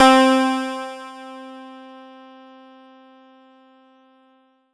Roland D-20 Rich Harpsichord C4

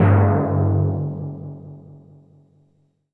Low Timpani

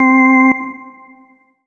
Yamaha TG500 OR Door0 C4

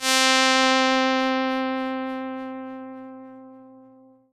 Yamaha PSR-22 Fantasy C4

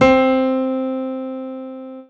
Yamaha TG 55 Piano C5

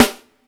Rim Shot; Korg NS5R

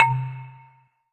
Korg NS5R Xylophone C3

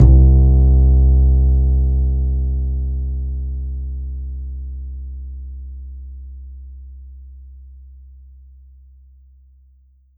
Korg TR Rack Stand Up The Bass C2

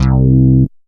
Yamaha TG500 BA Syn 4 C2

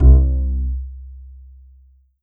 Roland PN-D10 Acoustic Bass C2

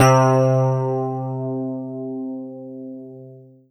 Yamaha FS1r Velvet Dyno C3

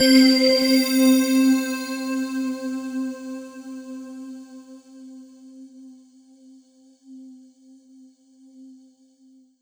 Ensoniq ZR-76 Bellmen C4

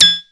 Klank

